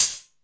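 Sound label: open hi-hat, drum & bass; Ueberschall; Drum & Bass Resonance; 150 BPM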